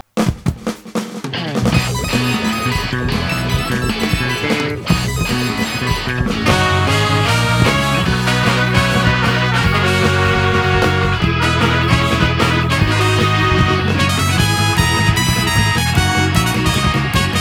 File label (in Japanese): 黒歴史ファンファーレ; hen koi kuro rekishi